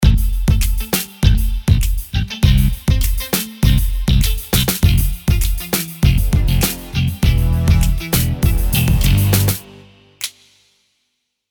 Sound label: Pop Badass Rock Music; Stem Mix